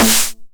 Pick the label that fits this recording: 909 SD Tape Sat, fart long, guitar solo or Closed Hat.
909 SD Tape Sat